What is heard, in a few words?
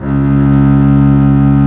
STR ARC BSS, STRINGS; Roland - LA Composer vol. 1